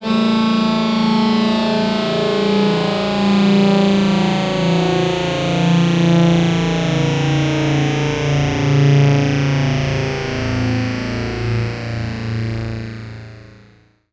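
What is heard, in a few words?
synth, Production Effects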